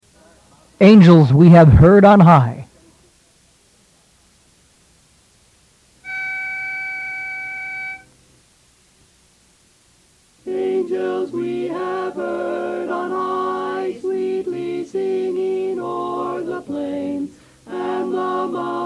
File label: Tenor, Chorus; Angels We Have Heard on High